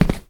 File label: Tile long